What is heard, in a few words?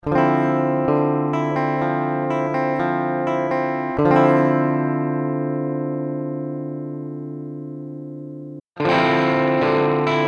MONSTER SP1000 Gt